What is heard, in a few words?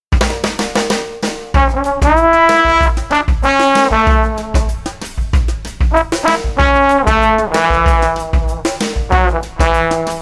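Street Drums Trombone Groovin, 95 bpm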